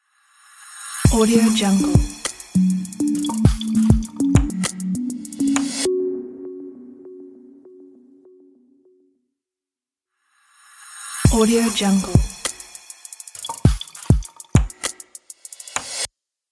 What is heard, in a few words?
digital beat logo